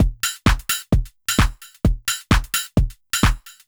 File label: Beat (130BPM), techno; techno drum fx